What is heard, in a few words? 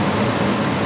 flying, weapons; serioussam2